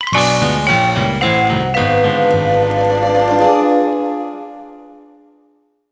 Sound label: Minigame Game Over; penguins of madagascar the 2010 nds